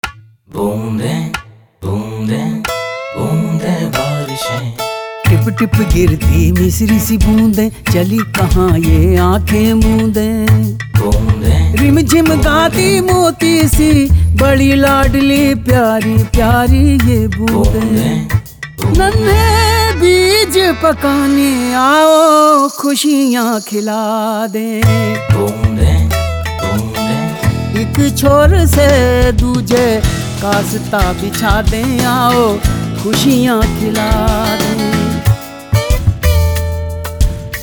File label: jingle; Kasta